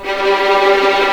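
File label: G3 tremolo, String; East West; The Ultimate String Collection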